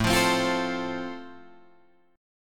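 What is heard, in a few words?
A Minor Sharp 5th, Guitar Open G, chord strum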